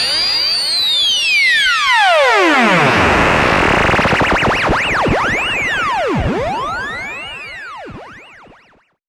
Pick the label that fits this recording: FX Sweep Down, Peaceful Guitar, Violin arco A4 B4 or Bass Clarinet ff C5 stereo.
FX Sweep Down